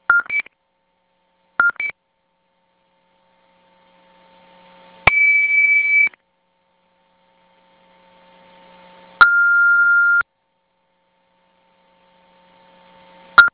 System III Default handshake tones with CID tone first; DSC; CID & SIA Event Codes